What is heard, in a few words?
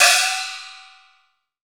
China, ChinesePrc; Akai MPC500